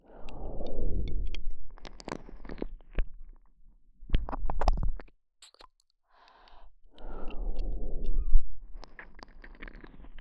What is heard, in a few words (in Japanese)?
ぐじゅう…じゅぱぁ…, 左耳